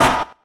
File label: mech hurt; hero-mark-2